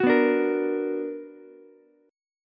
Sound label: B Minor Major 7th, Ukulele; strummed; JGuitar